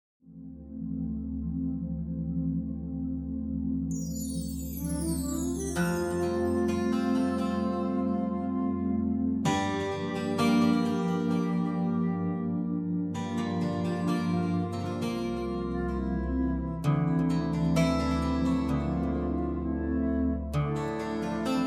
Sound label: (Dm) No Backing Vocals